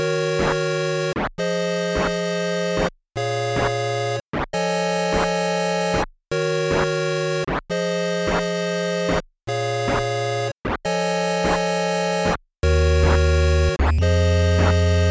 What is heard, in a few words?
8 Bit; evil technology